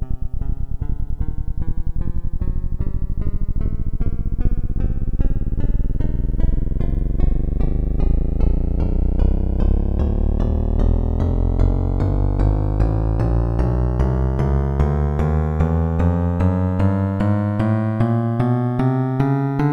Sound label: midi notes 1-127; Master Tracks Pro